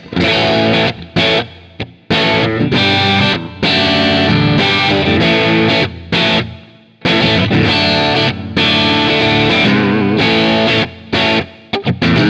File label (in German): Paul Reed Smith Beide PUs Humbucker Split Breakup; Thomann 70th